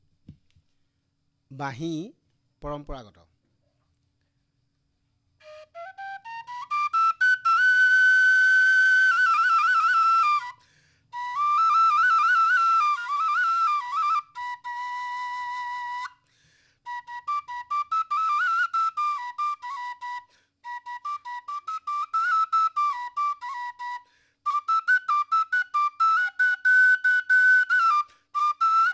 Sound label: Banhi Assamese Traditional